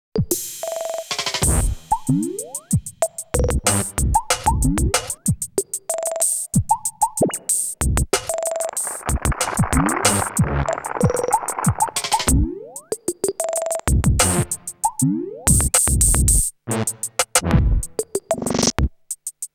Jump On Drift Ice

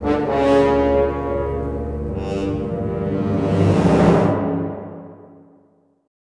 Tension; Star Wars Obi-Wan

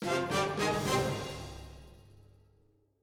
Music End 108bpm; Act Roma

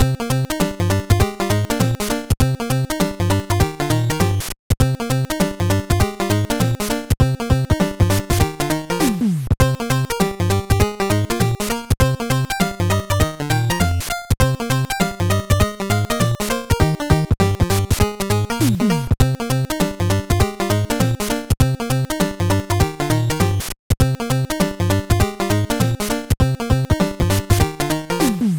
Grass Room; timmy turners gadget room 2020

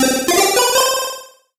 Music Effect Solo Chip C64 Positive; Stereo; Mushroom Heroes

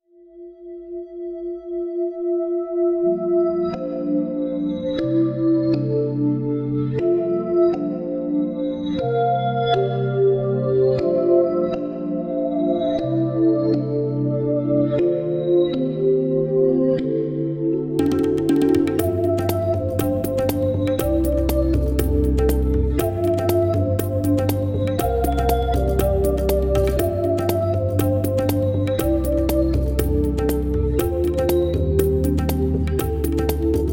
Ui Draw; Witch's Weapon; Android

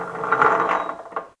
Platform move gear; Puddle; puddle plus ios gamerip 2018

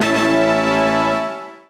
Stinger; Ringling Bros. and Barnum & Bailey; Wii

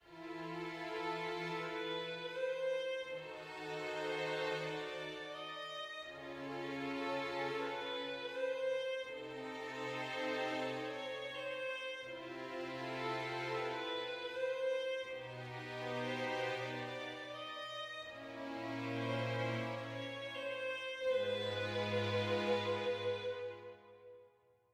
dark med

